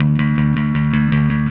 Happy Pub Bass

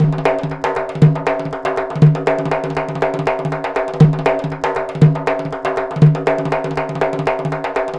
PERLP, ArabianTraditions

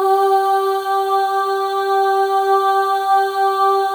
AH, FEM; Spectrasonics; FEM.2 AHS

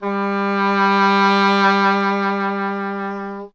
E. Horn

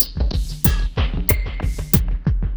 Processed Perc; Drums & Percussion; Processed Percussive; Sonic Foundry (Sony Creative Software); Electrocution; Beat Components

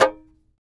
tar; Roland - LA Composer vol. 3

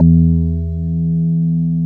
WOOD BAS

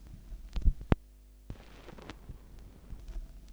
Stylus Down, Vinyl Noise

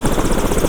ROTO BLADES, 3-D Audio; E-MU Producer Series Vol. 5; 3-D Audio Collection; 3D Multis; 3D Sprint Select 2

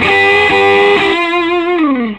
SOLO E, Vintage Blues Guitar

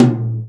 tom, Real Drum Kit, jungle kit

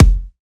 Kick, Dance Drum Kit

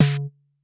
noise tom, Clavia Nord Drum

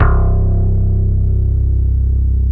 BASS, C1; Zero-G; Chemical Beats; BASSES

